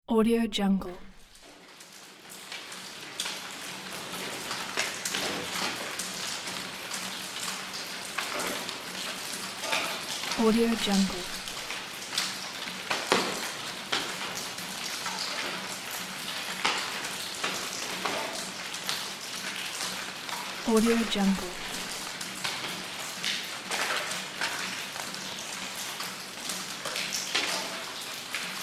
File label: cave